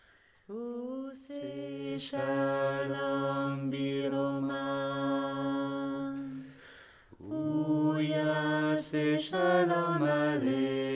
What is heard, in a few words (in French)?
voix adultes; ose shalom